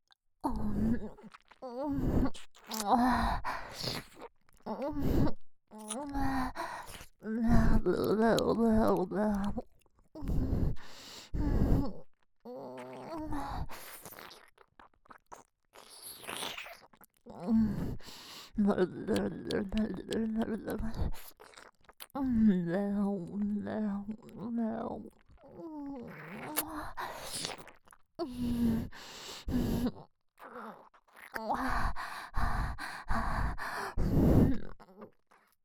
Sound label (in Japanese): 鼻息耳舐め 右耳